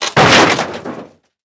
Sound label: metal fence; World of Tanks Blitz